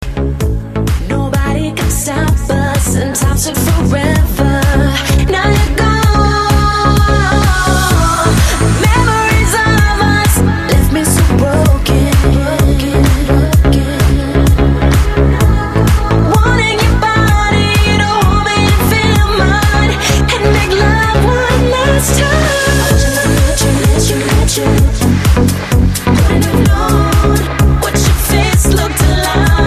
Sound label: electronic power engineering club mix